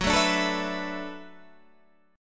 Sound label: D 6th Add 9th F sharp